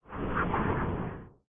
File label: float; smite; thanatos